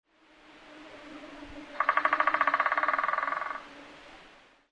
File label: white back woodpecker